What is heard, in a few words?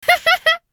Caramel All Sound; Jolly Battle